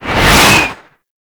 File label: Tank Cannon Projectile Whiz; Enemies; Weapons; Lasrian Tank; Warframe